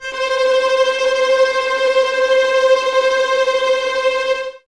VS C 5 Tremolo, 11 Violins; Miroslav Vitous

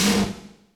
IND; Zero G; Man Machine

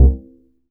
Arp, Upright Acoustic Bass; Larry Seyer

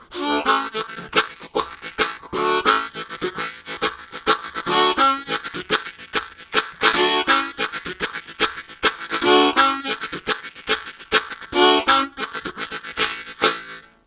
Slow Into Kicking, Harmonica Riffs; Zero G

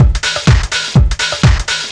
hologram, Deep House 125bpm

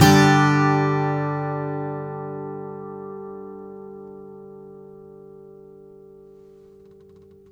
MAJ G UP, CHDMAJ, Blues Guitar Samples; ILIO; Fretworks

